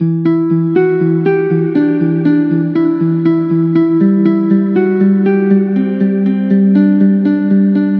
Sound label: Musical Loop 120 E Minor; Propose; Ghosthack